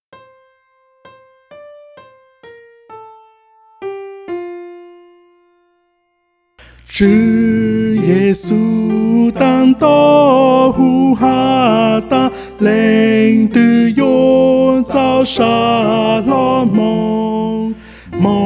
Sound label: tenor